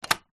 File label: foley battery push into plastic compartment